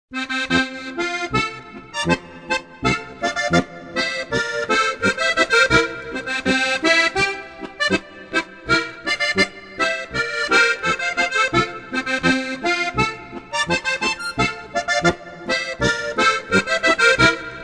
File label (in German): boarischer